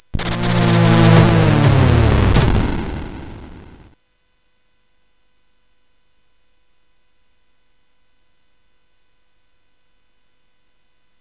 Combat Zone, sid CSG8580R5

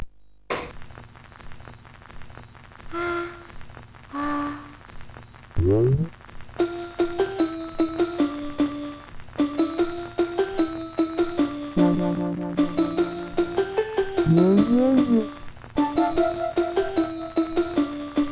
Music Box, CSG8580R5

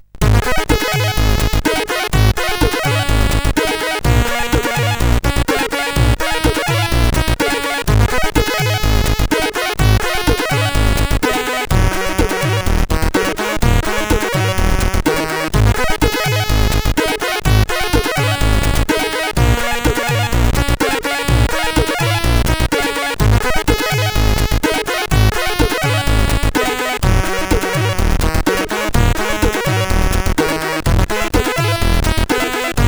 Rolling Melody, sid CSG8580R5

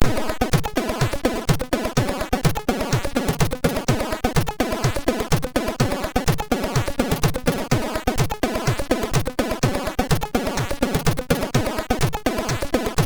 Music, sid MOS6581R2

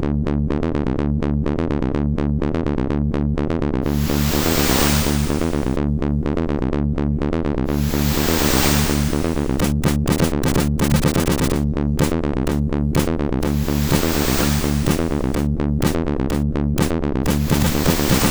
sid, MOS6581R4; String

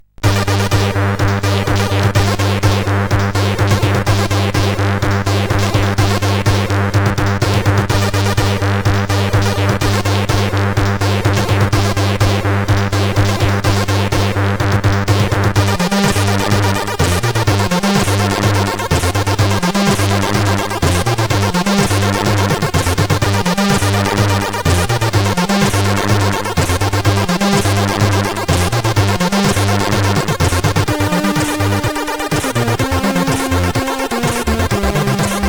Trebbler, sid CSG8580R5

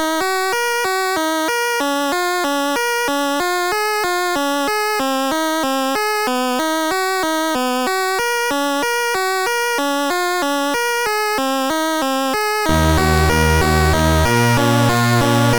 tune, sid, MOS6581R4

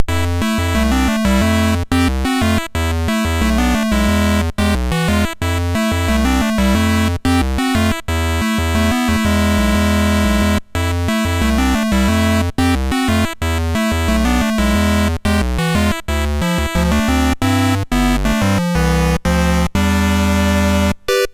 sid MOS6581R3; Perry Mason Mandarin Murder

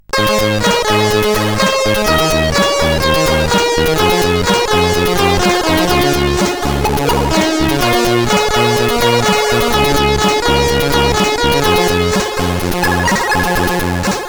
Intro, CSG8580R5

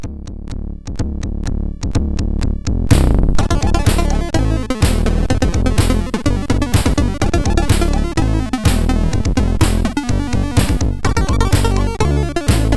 MOS 6581R2

